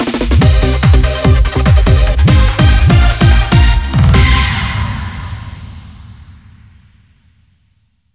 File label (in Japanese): 獲得ジングル; GK DREAM／GK BONUS